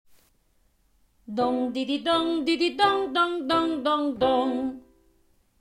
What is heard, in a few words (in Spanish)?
Twang oral "Dong did I dong" corto